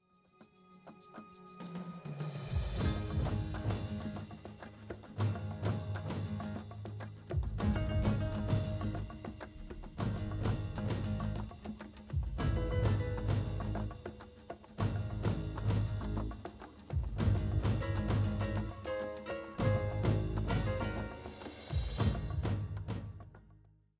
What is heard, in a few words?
bass; sports